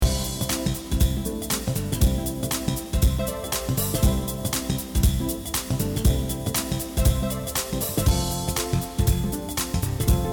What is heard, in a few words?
background music softer